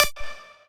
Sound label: lead